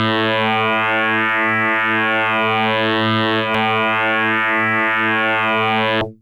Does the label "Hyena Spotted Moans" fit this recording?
No